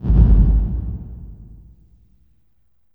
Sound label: LH K808, REVRB, LARGEHALL808